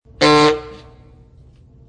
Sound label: Taxi Horn